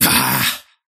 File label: parry fail; dota; kez